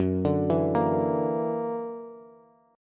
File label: Gb Augmented 7th Gb down Bass; Gb+7 arpeggiated